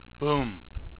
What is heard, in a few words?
boom